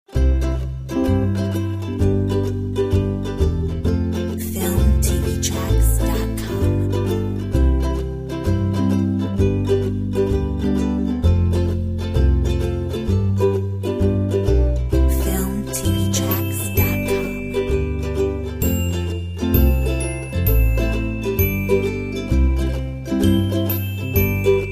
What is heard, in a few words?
Feeling Happy No drums, Uplifting Inspiring Royalty Free Music; Corporate, POP